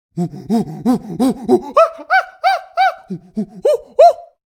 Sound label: Monkey Win; Pitch Black Audio Pong